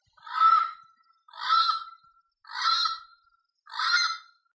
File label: channel billed cuckoo